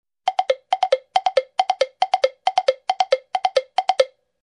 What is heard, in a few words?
Woodblock galopping rhythm